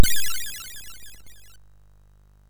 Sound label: sid MOS6581R2; Smash TV